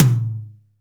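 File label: tom, real mega drums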